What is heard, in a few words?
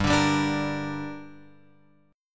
G Minor G down Guitar Open F, chord; strummed; JGuitar